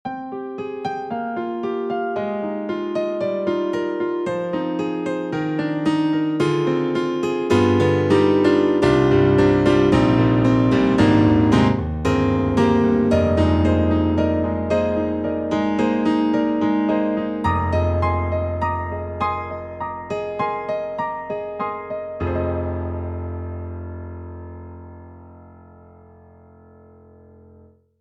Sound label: Pure Stereo Piano; KORG KRONOS 2